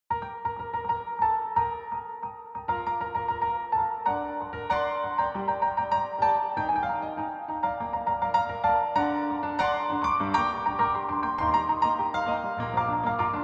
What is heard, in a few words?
Piano Cover